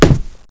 pl wood, player; valve

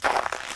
snow; valve

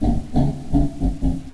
gorilla

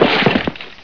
debris metal; valve

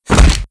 fall pain; valve

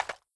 glock grip, weapons

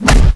punch, gorilla; valve

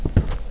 carpet; valve

